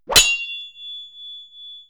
Sword Swipe And Klang; Weapons & Explosions

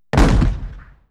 Explosion Cannon Fire; Weapons & Explosions

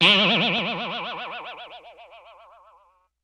Boing Box Higher; Vintage Cartoon; Hits & Tones

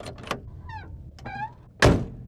Vehicle Door Old Open Close; Vehicles; Doors & Keys

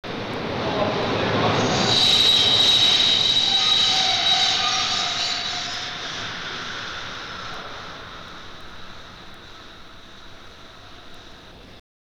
Subway

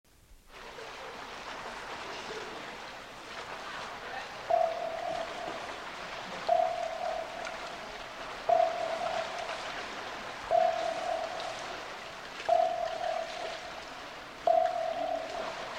Submarine Sonar

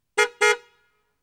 Car Horn American

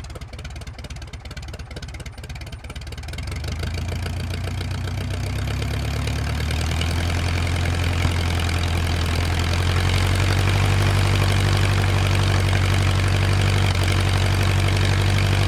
Plane Tigermoth Slow Idle; Vehicles; Aircraft

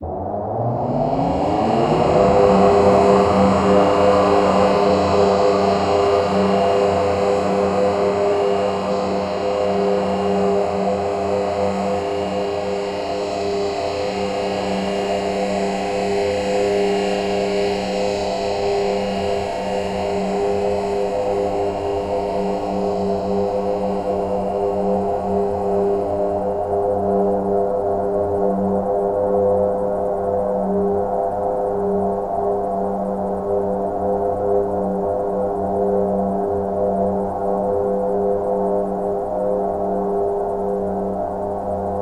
Space Ship Start Idle; Vehicles; Aircraft

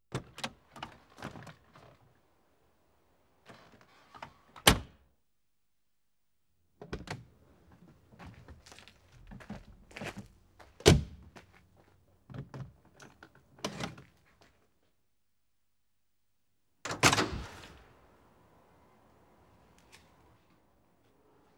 Various Car Doors; Sound Effects Library

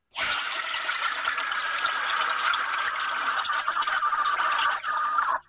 Monster Screech

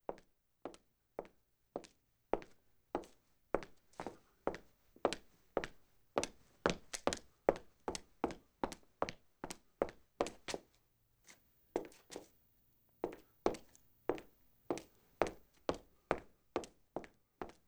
Footsteps Linoleum Fast; impacts

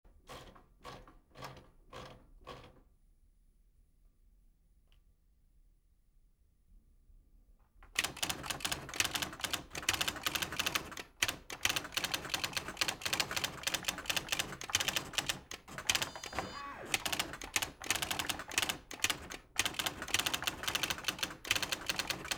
Old Typewriter

